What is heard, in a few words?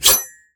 melee; unused, unnamed melee